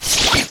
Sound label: katana kill